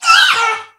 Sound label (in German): Ruf